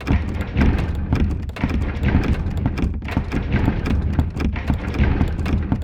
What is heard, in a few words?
Press Machine; loop mono; MACHINES & MECHANICS; Universal Sound FX